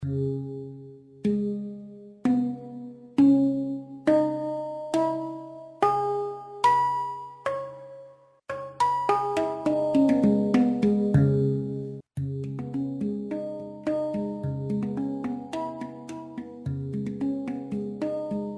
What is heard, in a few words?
handpan mond cis 432Hz; ziska